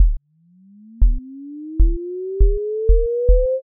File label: pulse